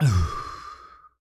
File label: sigh; HeavenStudio; forkLifter